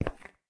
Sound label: footstep